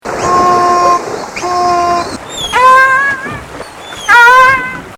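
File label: Transient, whales; Killer Whales (Orcinus Orca) are distinctively marked black-and-white dolphins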